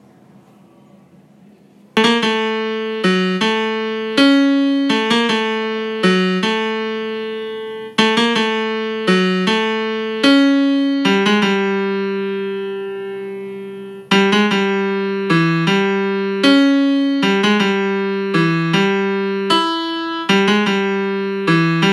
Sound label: melody